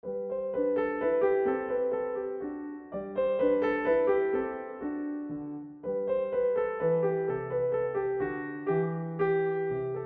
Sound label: Minuet D4 (3rd party reverb)